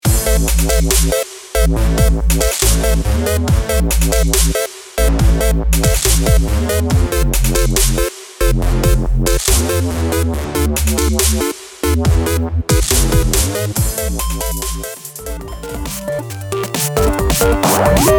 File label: Chiptune